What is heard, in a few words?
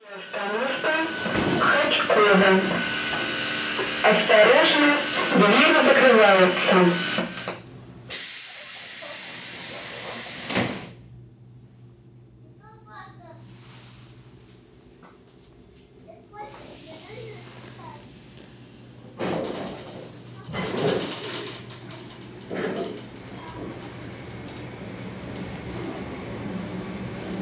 train; hotkovo; semen semenov